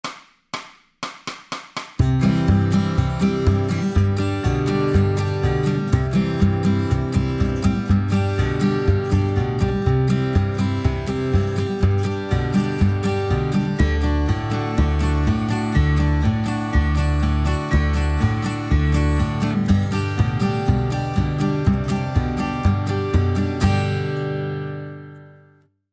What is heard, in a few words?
banjo rolls backing